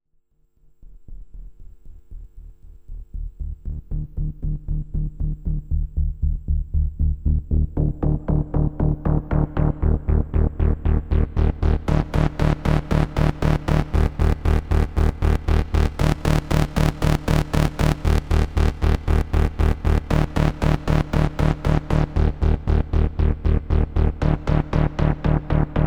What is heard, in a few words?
Impulse Command Cathedral; DRP-20